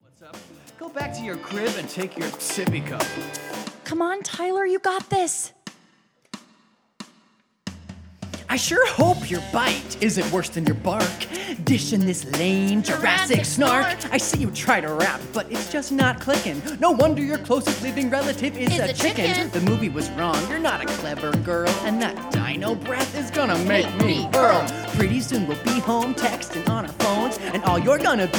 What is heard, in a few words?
Rap Battle; Miley Chase the Science Ace JV